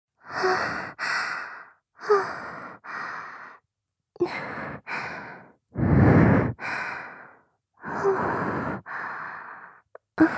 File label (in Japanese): 耳元・事後・吐息